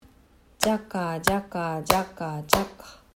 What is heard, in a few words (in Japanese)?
8部音符